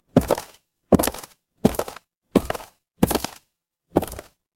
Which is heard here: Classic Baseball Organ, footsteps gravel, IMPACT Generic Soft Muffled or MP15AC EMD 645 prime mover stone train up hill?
footsteps gravel